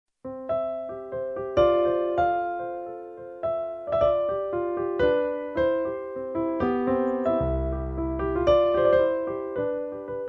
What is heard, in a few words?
piano recital